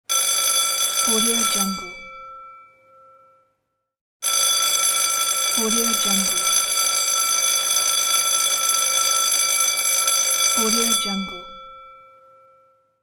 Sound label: school bell